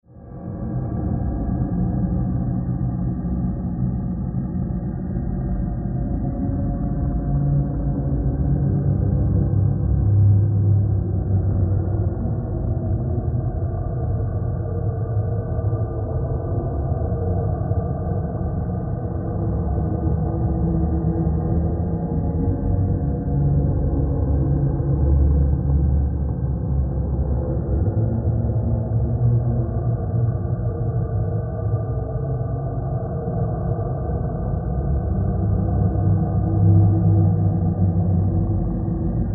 Horror Castle Haunted Ambience Drone Ghostly Scary; Scary Soundscape; Blastwave